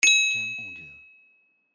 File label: Success Achieved Bonus